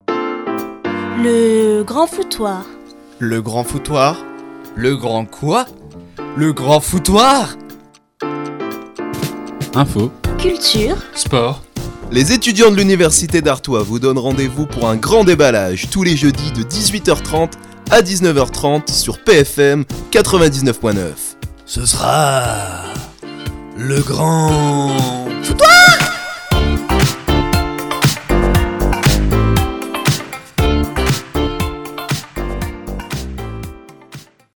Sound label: JINGLE; LeGrandFouttoir